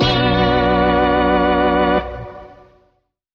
organ promenade

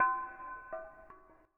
glass bells; Misc Percussion

